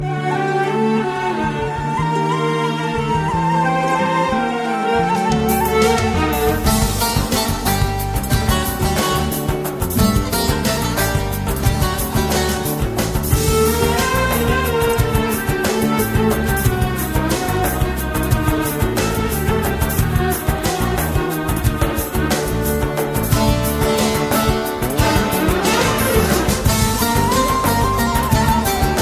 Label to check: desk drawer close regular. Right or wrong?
Wrong